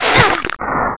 baba strike; zelda

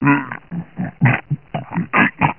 die; svencoop; uboa; ichyhakase

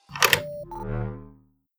respack close; svencoop; frostline

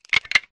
small key; svencoop; lostmaze

